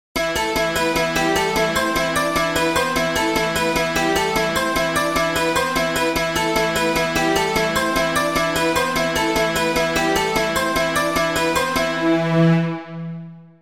T-bells

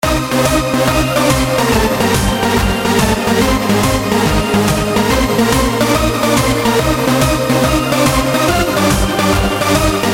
Hardstyle

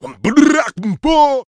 Yell; Nazeebo

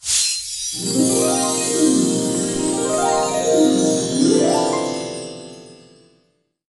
Lvl up

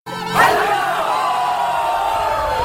Sms Tone

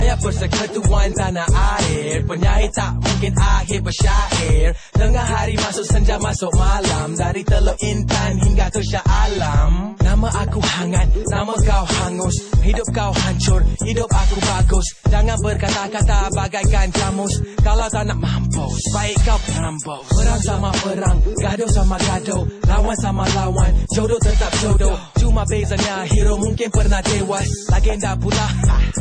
Song Rap

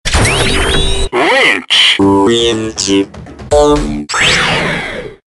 Winch Switch

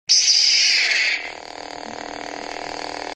Lightsaber Sound On

